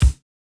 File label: kick, house